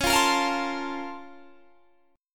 Bb Minor Major 9th Db

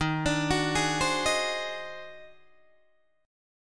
D sharp Minor Major 13th, Guitar, DADGAD; arpeggiated

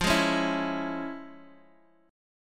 F Minor Major 7th Sharp 5th, F, Guitar, DADGAD; x,x,3,1,4,2

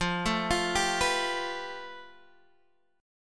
A 7th Suspended 2nd Sharp 5th

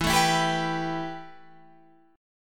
A 7th Suspended 2nd, E

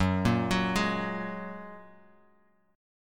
F sharp Major Add 9th, Guitar Open G

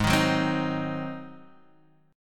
Ab 7th Ab down; Ab7 strummed; JGuitar

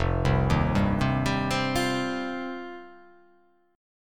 F 7th Suspended 2nd

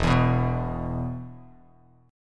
E 5th, Bass Standard; strummed; JGuitar

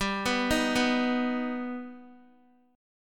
G Augmented G down Guitar; arpeggiated; 4F,4A,5C,5G,5C,6E

